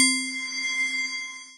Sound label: Afterbreath, Mallets; Ableton; Factory Packs; Synth Essentials; Collision